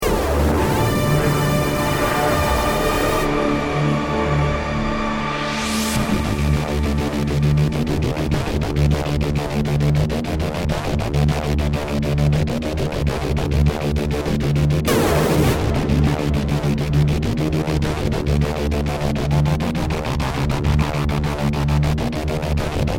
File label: start round, music; halflife alyx